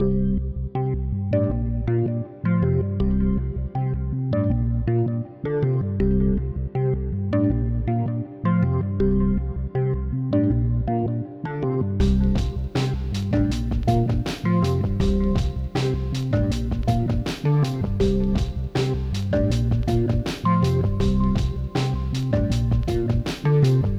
it's got a funky beat!

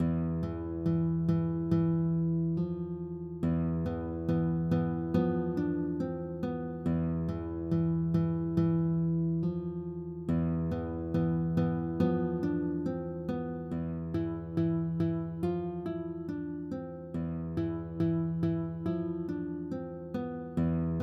gtr

